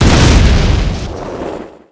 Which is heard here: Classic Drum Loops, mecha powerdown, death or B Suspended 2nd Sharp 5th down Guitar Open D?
death